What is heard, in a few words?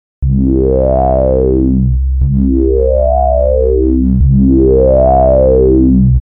res sweep